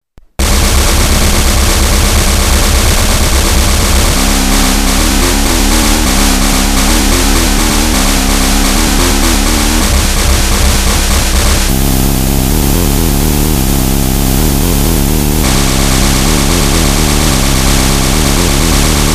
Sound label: NOISE; lolicore